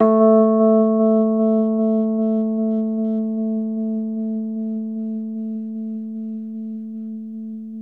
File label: Rhodes 73 A; E-MU Producer Series Vol. 5 – 3-D Audio Collection; 3DRhodes